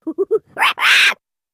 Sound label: Funny Monkey Grunts, Funny, Short, Monkey Grunts & Screeches